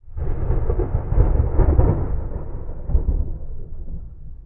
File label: thunder distant, ambient weather; Counter Strike Model Pack; CS:GO